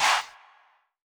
Not Nice Chants, Vox

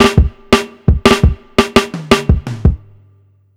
drum fill; topking

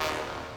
Brass Filter

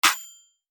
Clap

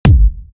EDM, FX; Stud

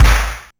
Snare, LinnDrum

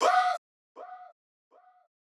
Vox

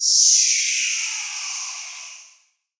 SFX

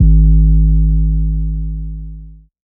808 G#; 808s

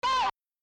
Chant

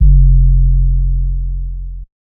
808s

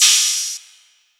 Hat Open

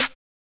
Open Snares

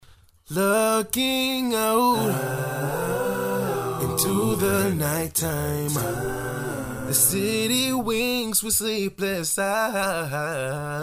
Acapella

